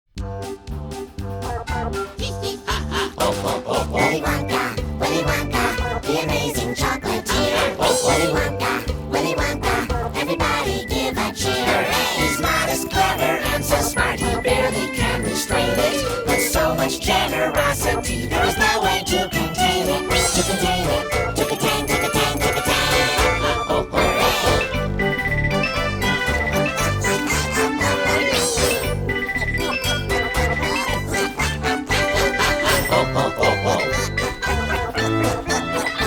welcome song; wonkas

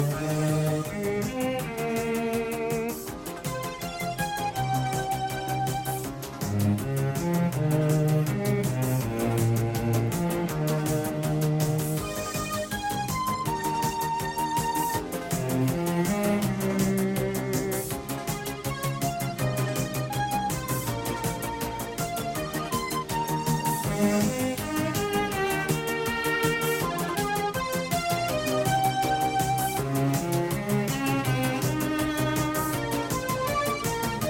mystery music

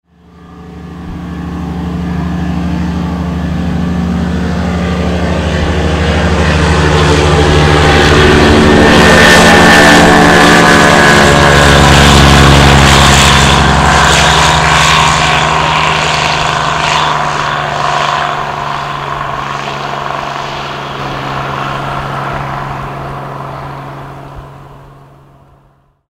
overflying propeller plane; salamisound

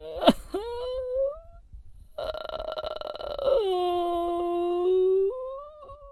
crying crying

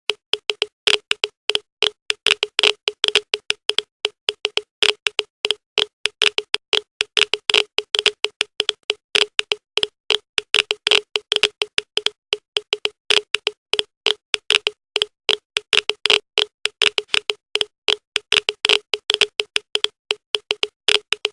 geiger counter sound

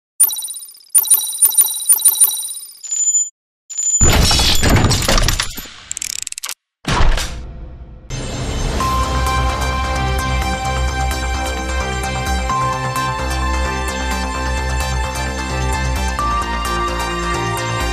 News Station ID Motion Graphic; Cinema 4D

